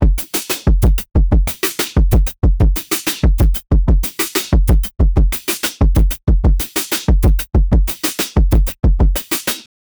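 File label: drum beat machine; drum drm drm beat macine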